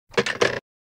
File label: Phone Put Down